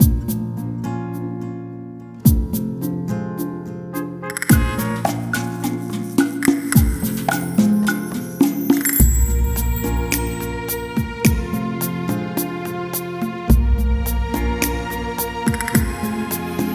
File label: bonus level; Recode; jewel Quest Riches